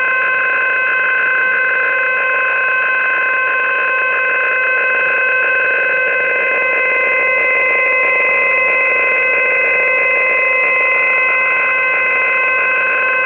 CIS 3x PSK idle

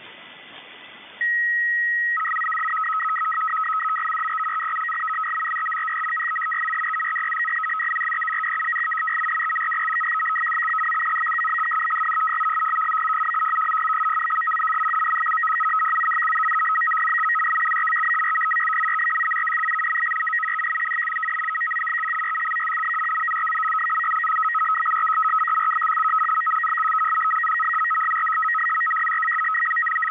FSK 100; Polish Intel 100 Bd / 625 Hz FSK system with clean signal

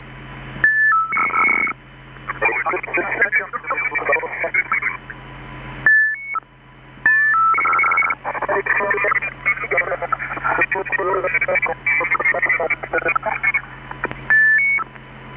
FRENCH AF VOICE CRYPTO; FAF SCRAMBLER

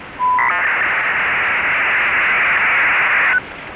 Chinese MIL hybrid 2400 Bd BPSK modem; with full burst

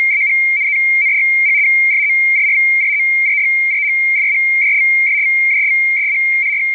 SITOR-A ISS carrier; SITOR-A in ISS mode with constant carrier between bursts; FSK-systems

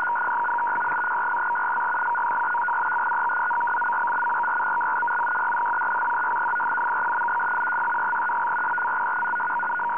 CIS 150 Bd PSEUDO#

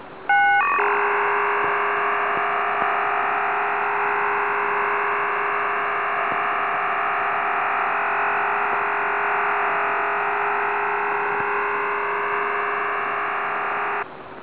NATO39 TONE; MIL-STD 188-110A appendix A; 16-TONE QPSK

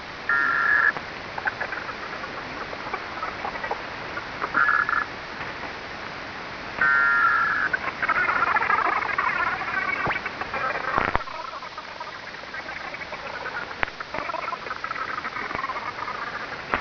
VP-116 VOICE PRIVACY UNIT; ROCKWELL COLLINS; VP-110/VP-116 VOICE PRIVACY UNIT; (e.g. US CUSTOMS/DEA)

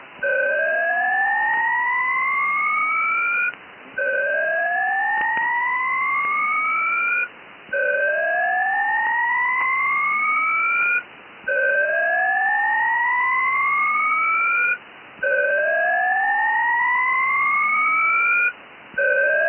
PACTOR-IV DBPSK CHIRP

